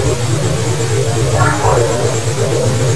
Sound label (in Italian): laser